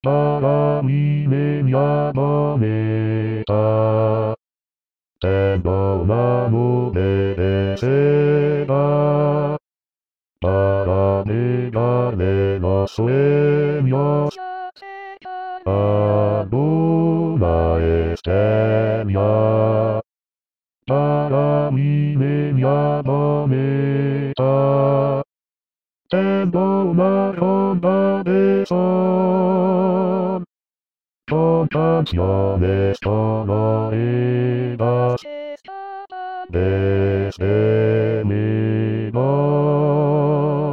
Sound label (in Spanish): bajos, con voz